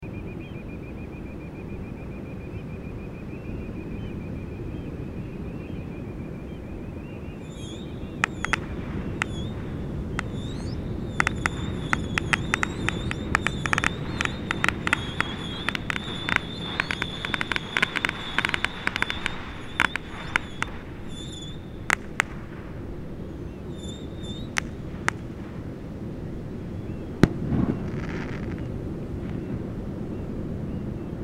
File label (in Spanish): SILBADORES FUEGOS ARTIFICIALES EN PLAYA SAN ANTONIO POR AÑO NUEVO